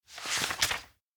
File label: book pages flip; anemoi